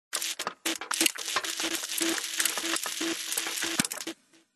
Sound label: science fiction steam punk machine operate clicks clunks; audio mangler